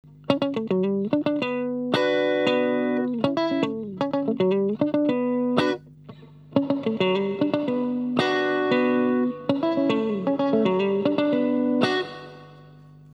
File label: Reverb